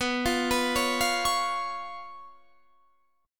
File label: B Suspended 2nd Flat 5th B